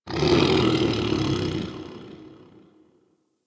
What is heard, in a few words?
Forest Distant Creature Medium Cry; Warframe; Grineer Forest